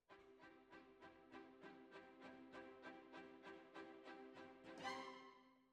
Flute

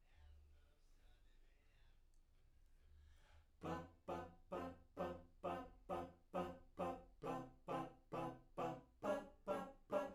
Backs; SCHOEPS

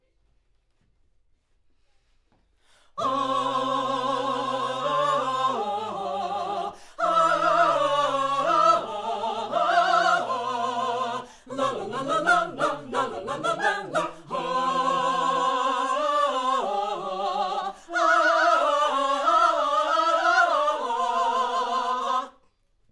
Backs AKG LEFT

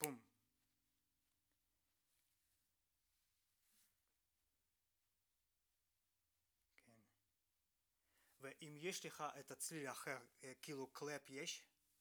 Tape GTR

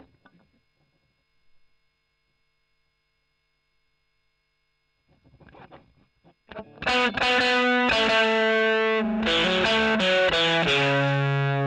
E.GTR OD; Gefell

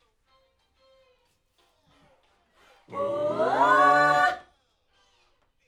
Backs AKG

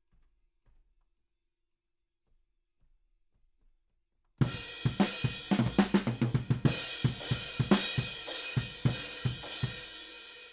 Ride

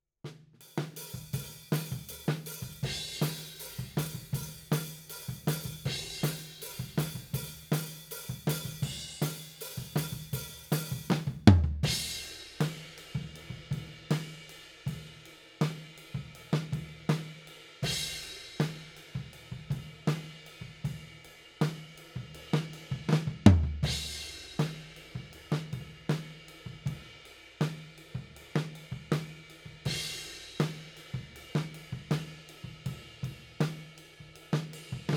Tom, 160 BPM

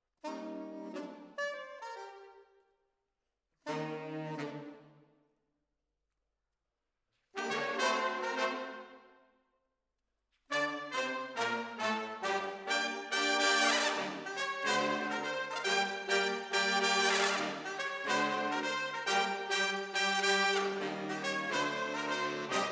Decca, Jazz for piano

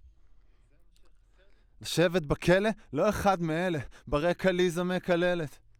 Voc

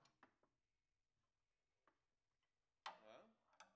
Kit

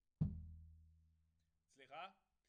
Tom

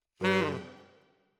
Tenor, RNR for piano

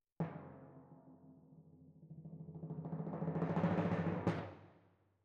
Timp Close

